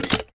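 foley assault rifle akilo47 drum reload fast mag out; weapons; viper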